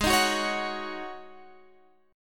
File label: Db Suspended 2nd Suspended 4th